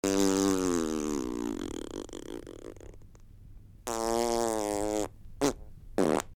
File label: really big fart